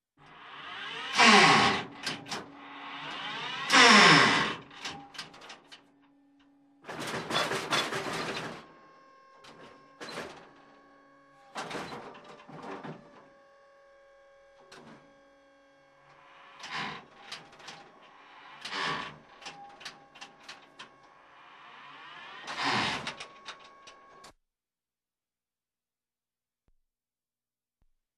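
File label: Tank, turret movement; Танк V5-58 движение башни; Звук танка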